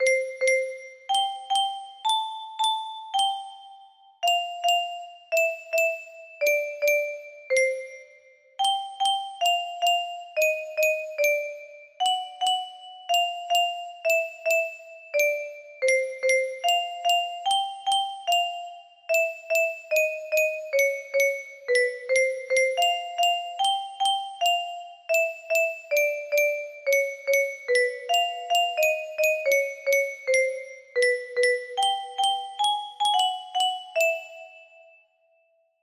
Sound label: music box melody